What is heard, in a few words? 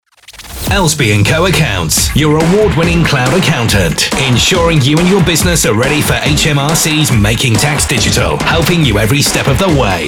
Jingle